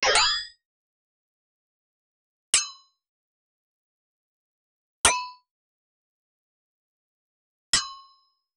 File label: sword strike in pixel gam